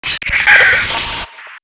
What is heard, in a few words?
advisor transmission; hl2 ep2; ambient, levels, outland